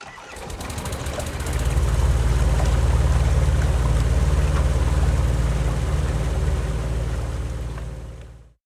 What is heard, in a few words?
Motor Start Up; Hustlebonez Founder